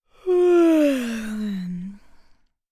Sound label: female yawn